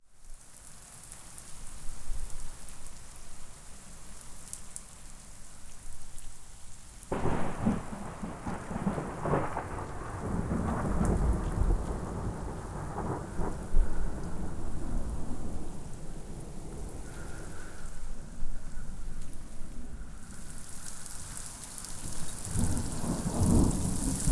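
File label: Distant Violent Thunderstorm, Outdoor Ambience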